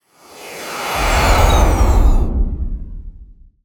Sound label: spaceship passby; Bluezone Corporation